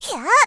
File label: attack; Eternal Return; Tia; Voice Line; KOR